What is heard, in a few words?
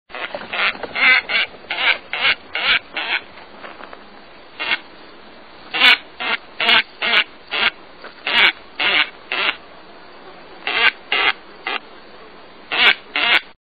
White-faced Ibis; Birds